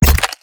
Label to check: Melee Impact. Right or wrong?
Right